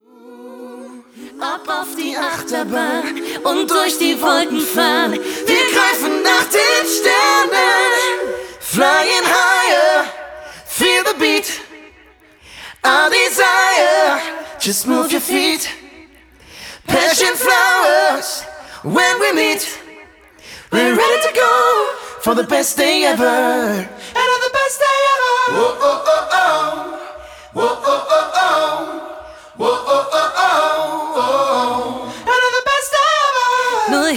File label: Acapella Deu-Eng